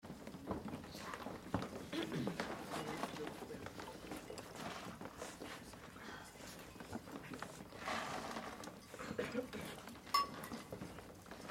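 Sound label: sounds of clinking glasses at a celebration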